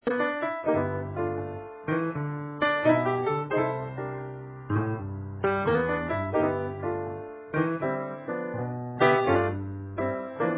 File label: blues, solo piano